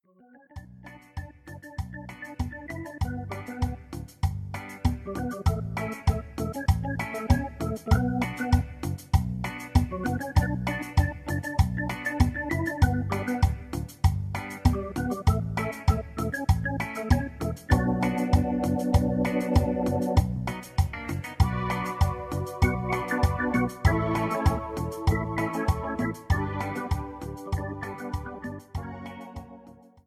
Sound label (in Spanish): Cumbia; ritmos